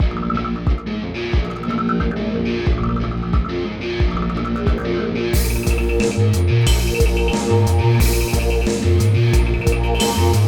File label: Evil Frequency Boss Loop A; Stage2 Evil Frequency; Vector Strain